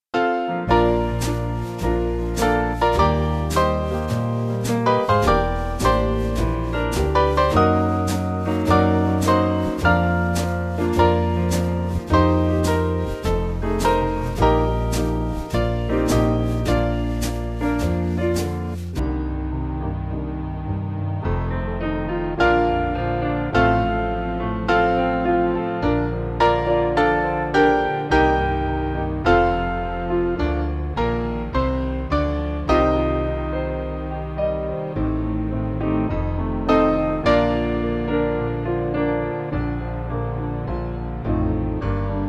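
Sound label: Band, Music Backing Files for Churches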